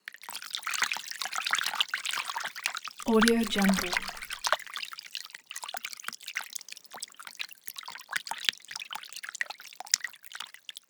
water soft hand agitation; soundmorph

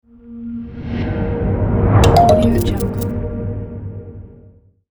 design logo

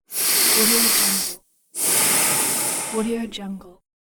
breathing loop

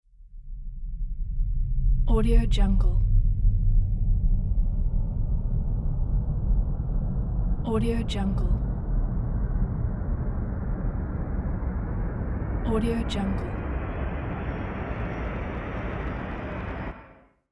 cinematic sound design

